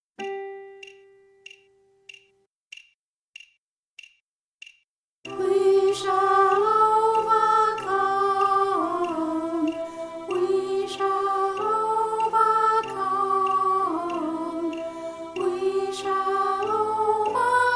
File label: Soprano sung